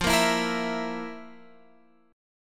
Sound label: F Major 7th Suspended 4th, Guitar, Open F; FM7sus4; x,12,10,11,13,11; strummed